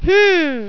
hmm

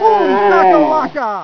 SOLD DIE

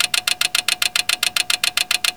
clacker

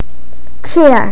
chair

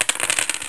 many dice

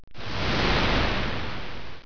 splash

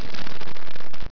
BOOK

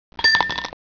COIN

